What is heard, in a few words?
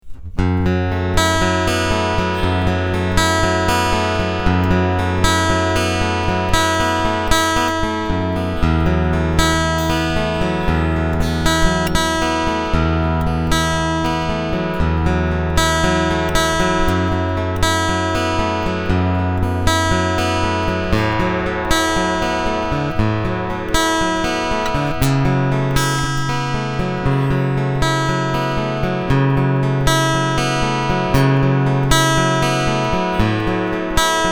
Picking Boost Bass Treble